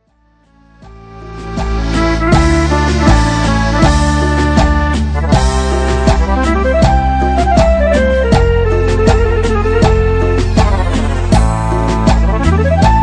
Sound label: Czardas Cumbia